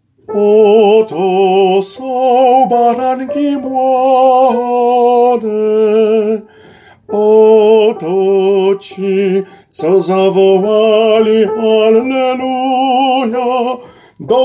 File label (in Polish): Oto sa baranki mlode SOPRAN